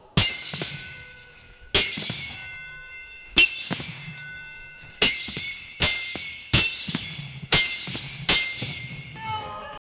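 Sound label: medieval battlefield ambience, clashing swords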